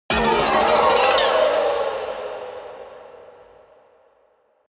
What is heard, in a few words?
haunted strings weird stroke ricochet